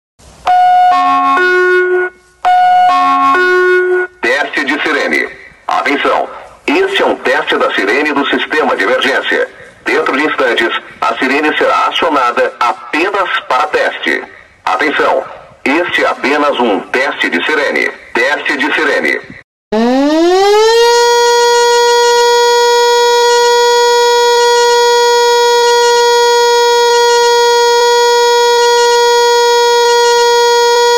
Teste, sirene alta potencia incendio; 27C10

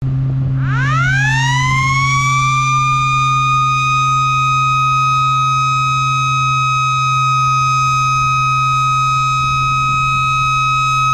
Som, sirene alta potencia incendio; EG-105; eletromecanica; Engesig Sinalização e Veículos Especiais

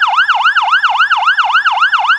WAIL RAPIDO; REBT 27C20

